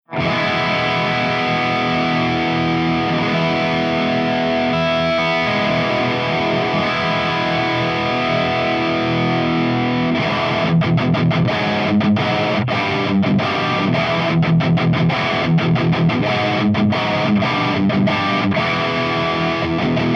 ENGL SP CH3 DRIVE V30 P90

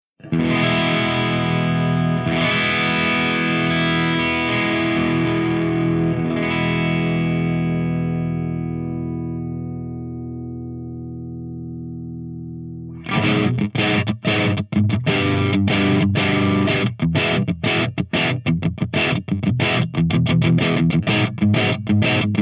ENGL SOV CH2 CRUNCH V30

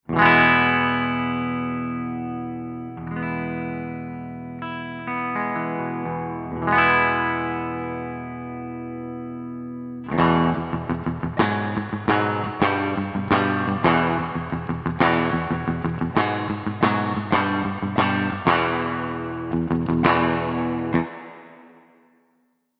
FENDER75 STANDARD REVERB P90

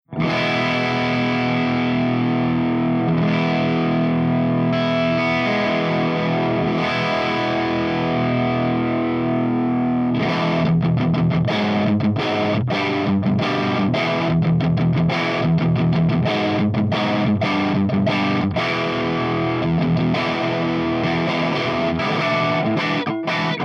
MESA STUDIO CRUNCH V30 P90

